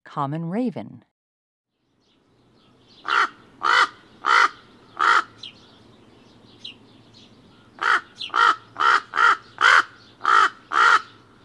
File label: Common Raven